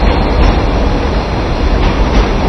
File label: subway; 暴力赛车